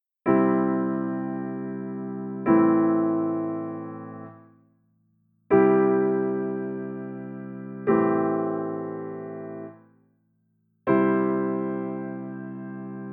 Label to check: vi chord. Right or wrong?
Right